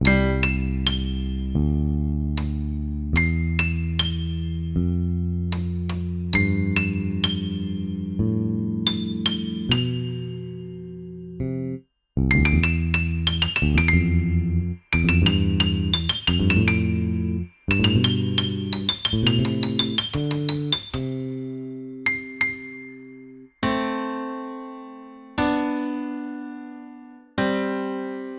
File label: Relaxing Lanscape Piano Soundtrack